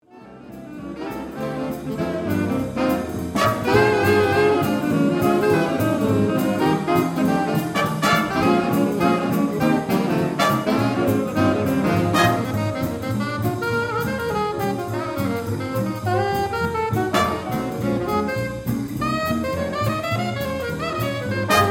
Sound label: BigBand Swing Style